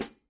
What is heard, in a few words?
dark energy hats mark i chick; paiste 14 dark energy mk I hi-hat; Signature